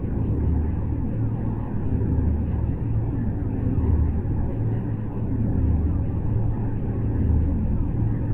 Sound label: cargo bay; ships; rooms